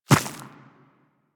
Infested Small Walk Dirt, Footsteps; Warframe

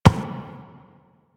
Ram Step Walk Dirt; Duviri; Animals

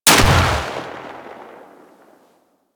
Fire Shot, Weapons Lever Action Rifle; Warframe; Tenno; Sybaris Prime

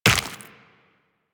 Run Flesh, Footsteps; Warframe; Tenno

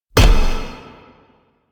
Bash Hit; Warframe; Hoplite

